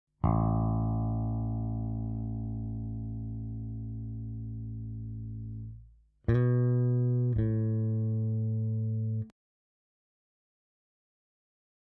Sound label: Bass, drumfill